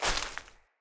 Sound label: Grunt Step Veg, footsteps; Enemy; Corrupted Sproutfolk